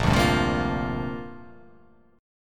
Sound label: A sharp 6th Add 9th